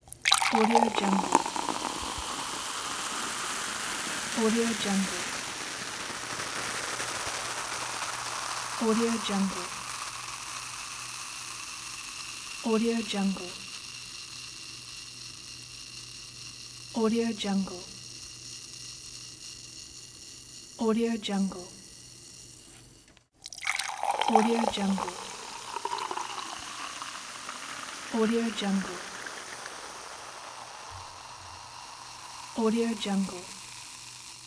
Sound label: soda can pour; soundcreator